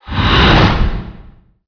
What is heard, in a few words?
vacuum tunnel enter